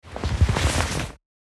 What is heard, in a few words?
Handling jacket noise clothes